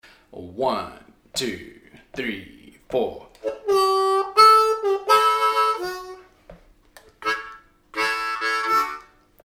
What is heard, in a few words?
Lick, harmonica